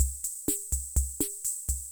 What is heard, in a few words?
Loop, classic machines; arranger